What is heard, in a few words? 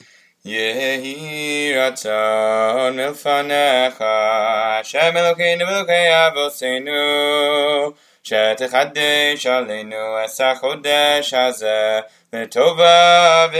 Birkat Hachodesh Fast Melody; Musaf; Tov LHodot